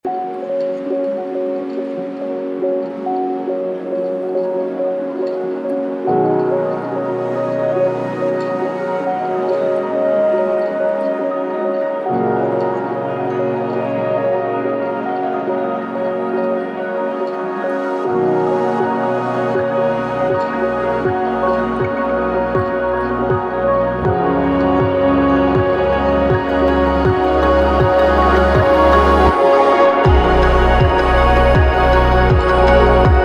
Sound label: June Ambient